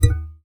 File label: Classical Guitar Extended Technique